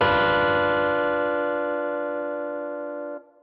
Gtr B2

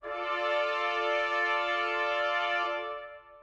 Trumpet D3

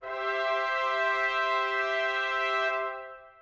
Trumpet E3

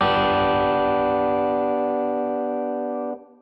Gtr G2

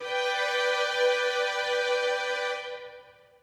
Strings G3

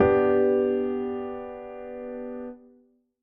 Piano G2

